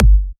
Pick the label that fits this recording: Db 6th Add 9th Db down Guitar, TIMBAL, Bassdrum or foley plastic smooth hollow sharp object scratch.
Bassdrum